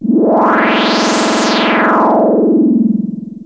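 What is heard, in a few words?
sweep, 202 synth construction kit; multisampled; synth keys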